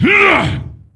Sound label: Attack Medium; Jaraxxus